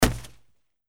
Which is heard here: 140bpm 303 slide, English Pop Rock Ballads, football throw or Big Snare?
football throw